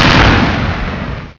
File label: explosion